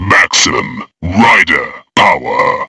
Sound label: rage instant teleport; freak fortress 2